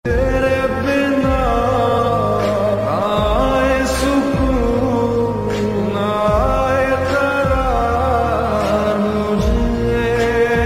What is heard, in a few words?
Sad Ringtone